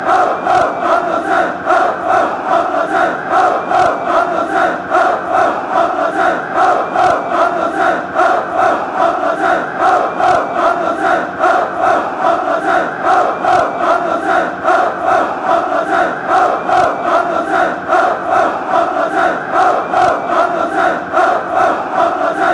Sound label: oh oh oh, fanchants; luzern